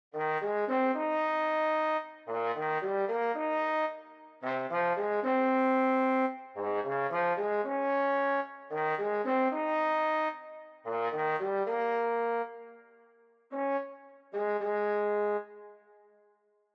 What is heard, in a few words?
Trombone; 吹奏楽; R6 吹奏楽部 卒部式